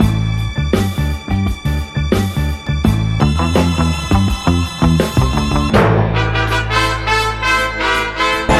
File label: guitar